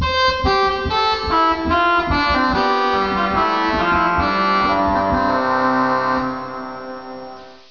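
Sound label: Trompete 8, organ; Martin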